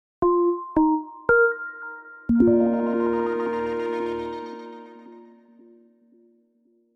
Synth Stinger

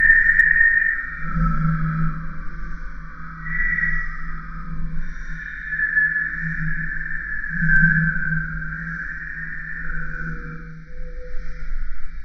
Scream Of Void

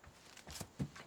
Kid Sneaking footstep Quick Stop

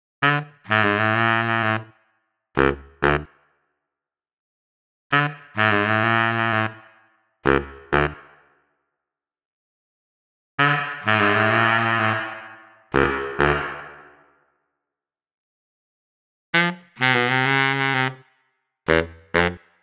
Boom Boom Cleaned Improved

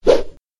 Swing

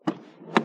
Car Door Open

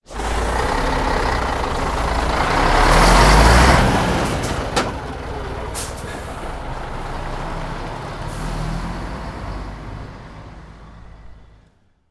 Heavy Truck Departure

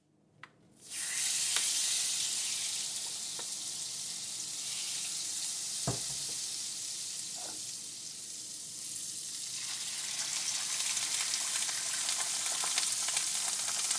Frying Egg

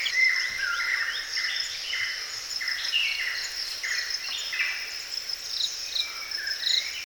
Birds Sounds In A Forest

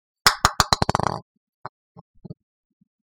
Dropping A Hollow Wooden Ball

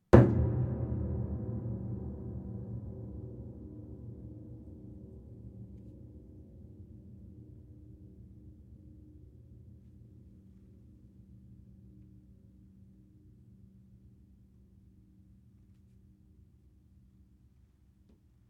Piano Hits Mallet

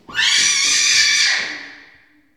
Baby Scream

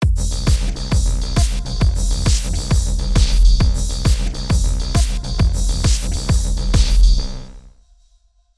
Psybeat Loop

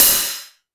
Hi Hat

